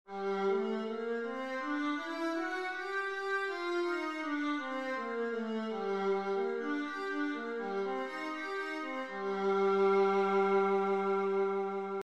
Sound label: viola g minor, scales